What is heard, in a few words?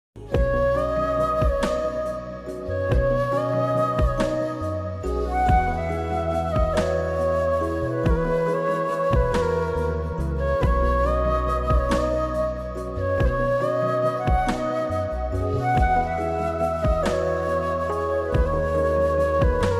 instrumental ringtone, flute ringtone